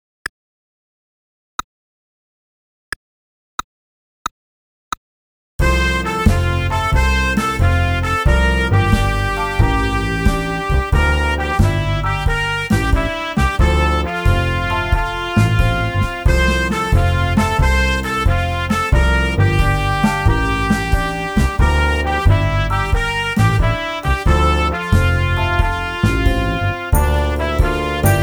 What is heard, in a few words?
Trumpet Melodie; trumpet fun vol 1